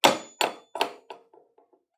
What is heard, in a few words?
Door Knock; soundscrate